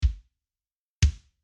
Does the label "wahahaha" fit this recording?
No